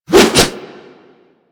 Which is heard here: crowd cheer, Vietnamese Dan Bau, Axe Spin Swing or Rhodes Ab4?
Axe Spin Swing